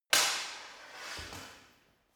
sword hit sword single

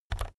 keyboard external key press very fast soft

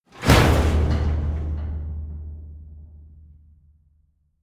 metal container impact large single

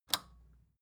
record player power release off

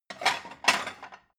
plate multiple take hard

